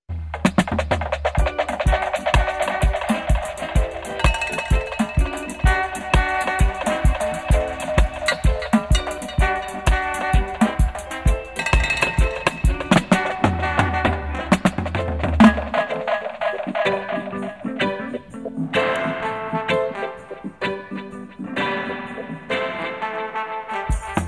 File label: Thunder dub